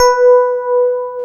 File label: PIA DX E-PIANOS